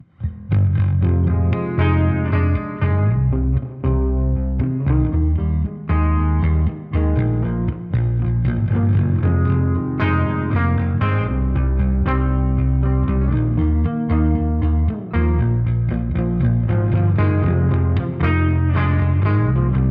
Emo Guitars Bass ohne Drums; OF FATHERS AND SONS – Soundtrack

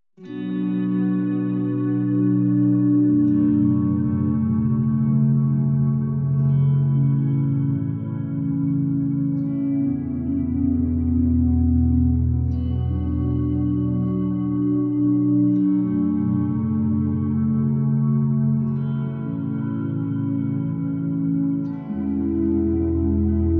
Tragic Guitars Pad; OF FATHERS AND SONS – Soundtrack

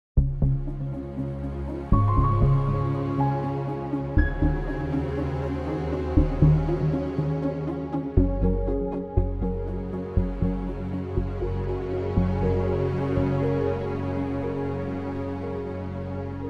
emo Release

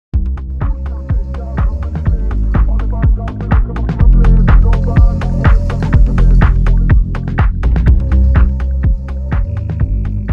BEAT; OF FATHERS AND SONS – Soundtrack

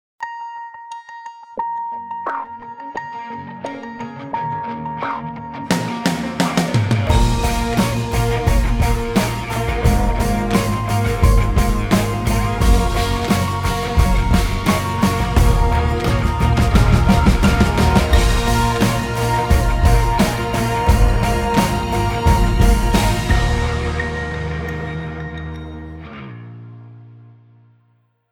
Contemp Rock RomCom

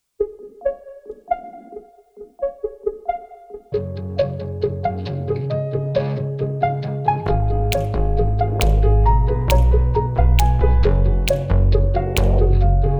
electro Pop Comedy

